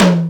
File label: acoustic tom drum one shot f key, Royality free tom drum single hit tuned to the F note; tom drum samples; one shots